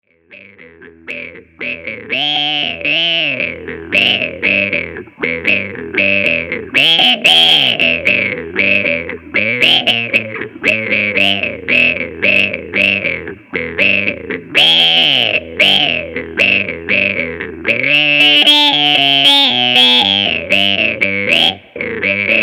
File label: Bass Balls Muff